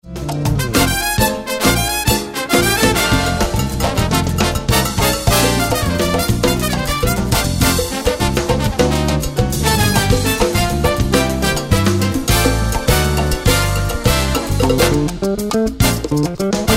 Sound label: Latin Blues